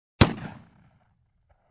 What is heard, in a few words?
Machine gun shot once